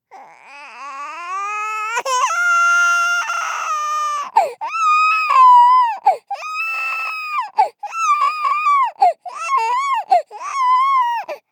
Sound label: BABIES CRY Girl 24 months Fade In; Baby Boom